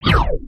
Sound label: zoom out